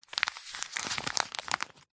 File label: money 20 dollar polymer note light handling movement